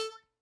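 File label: Hohner Special20 F vibrato release A3, harmonica; Aerophones; Releases; Free Aerophones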